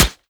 default concrete rock, bullet impacts; physics; Half Life Model Pack

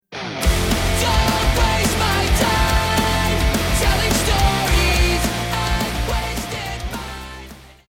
won round, music

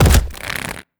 elevator grabbed, headcrab classic; headcrab classic, scripted; creatures

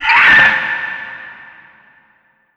vent screech, headcrab reviver, creatures

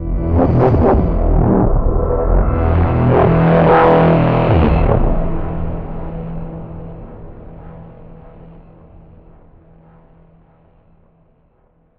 vault warp; Half Life Alyx; music

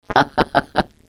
laugh, glados; Portal 2

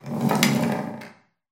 ambient sanctuary wood; alyx sanctuary wood

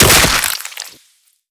antlion leg dismember

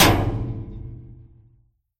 drone hit carousel; scripted sequences

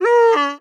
warning, zombie vox; creatures

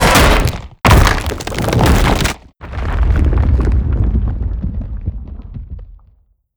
crack, destruction; a4 strider; swipe

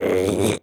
mannequin grunt

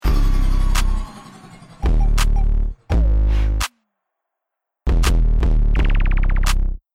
deathcam

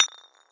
glass bottle impact hard; physics

